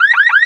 Buzzer

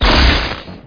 body hit; MOTS

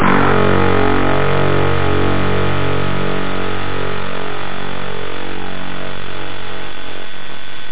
dist guitar

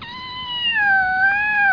cat; Immoral Angel

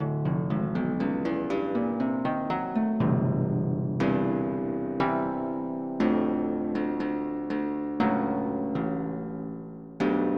Bright Harp

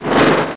Open Drawer

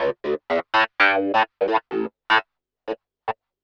SYNTH HOUSE 125 A; eJay; Keys

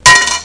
coin out; pvpoker

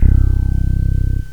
Synth Bass 4; gmplay

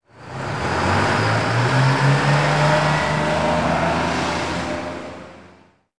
traffic; zones

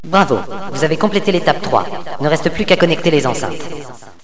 kit; Villagina; ecole

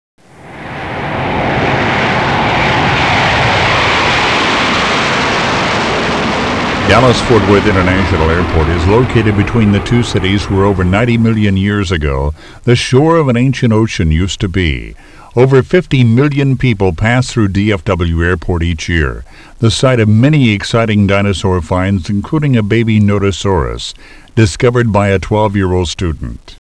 AIRPORT

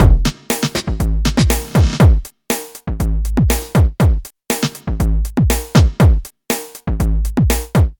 drum; widdagroovebox

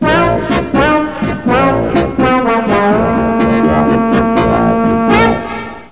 Bankrupt; monopoly

